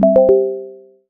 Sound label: Device Disconnect; Emberfall